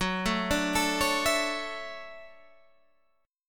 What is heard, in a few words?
D-Major 9th F sharp